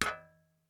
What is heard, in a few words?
hit